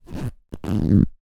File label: human feet skin rub hard squeak